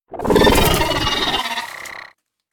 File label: Queen Showup